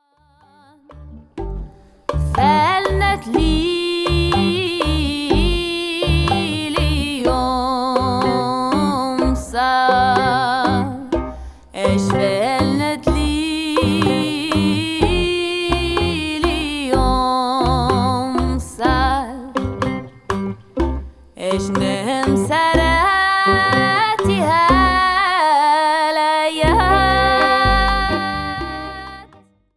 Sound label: Tango